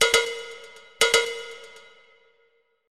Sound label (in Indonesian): Nada Dering Nokia Jadul; Daze